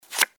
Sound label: click card; jinteki